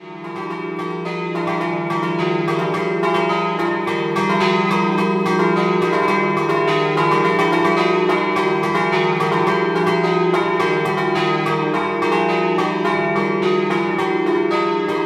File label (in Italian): TREPPO CARNICO, campane